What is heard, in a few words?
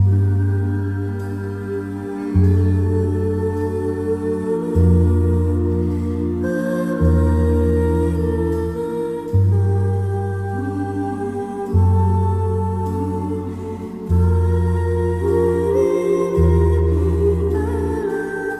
voice dial up, Loops, Sad